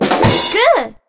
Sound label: Success; Eft; Mini Game; Monster Corporation